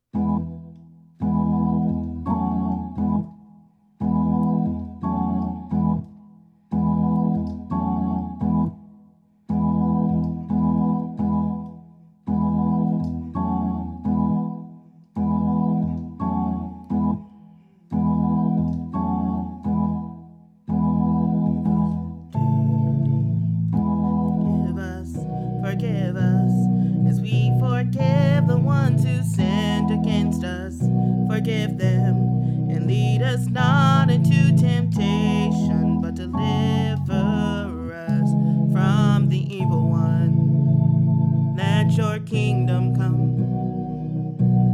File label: Tenor Only; summer choir